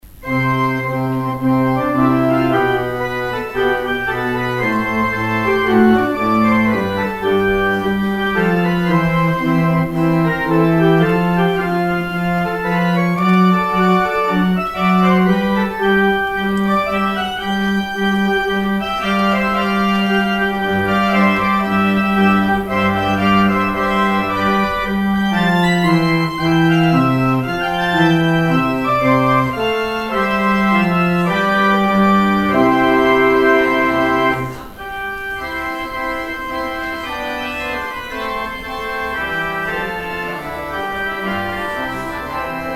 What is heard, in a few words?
organ outro